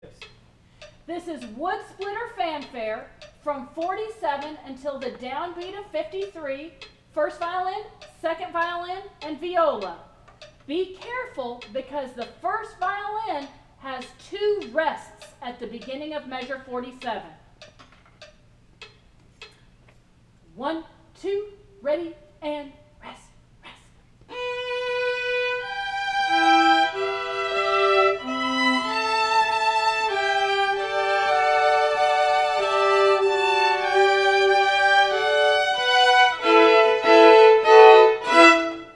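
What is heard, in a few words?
Wood Splitter 1st 2nd viola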